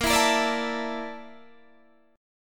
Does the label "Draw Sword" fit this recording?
No